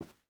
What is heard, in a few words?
footstep hide carpet walk; Rust